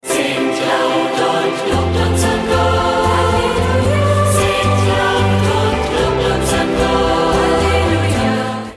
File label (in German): Singt Laut